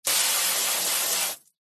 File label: household garden hose spray water jet plastic bin lid